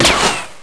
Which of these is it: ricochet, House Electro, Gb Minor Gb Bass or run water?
ricochet